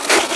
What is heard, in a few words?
THUND; sound canvas